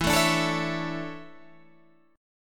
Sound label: E 13th Suspended 4th